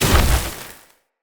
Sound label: Casting Device Block Parry; Weapons; Player